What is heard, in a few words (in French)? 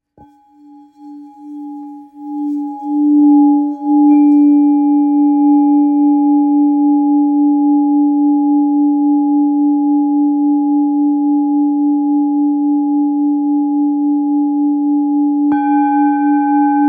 Bol real fullmoon re